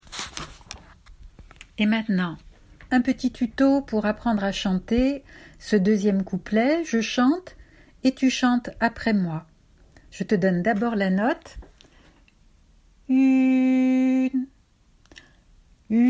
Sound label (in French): une tulipe couplet 2 chanté par morceaux